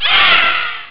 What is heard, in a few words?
cry; Amiga Soundtracker sample